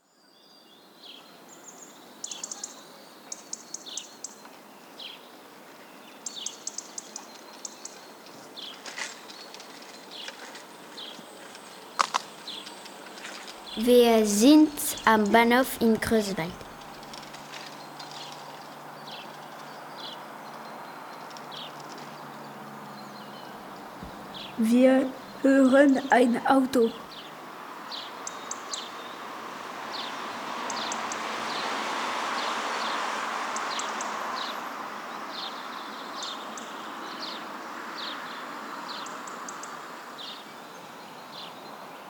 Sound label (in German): Bahnhof; CREUTZWALD